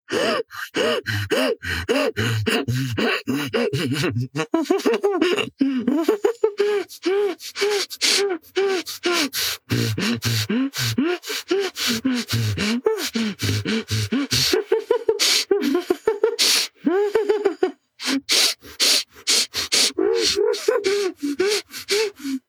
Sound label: inmate chase